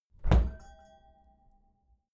glass door close heavy glass clang

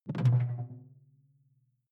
sci-fi alien splash detuned soft; swooshes-swishes

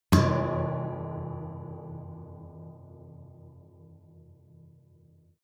underwater metallic

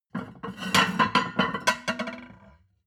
plate on plate take multiple, restaurant cafe

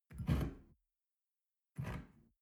shell grab from tablecloth soft; soundpool

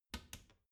bathroom cabinet door creak close soft

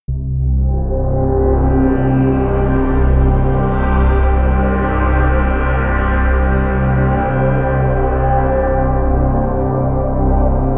drone pyramid

